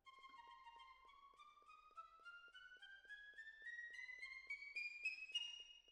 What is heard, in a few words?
Cellos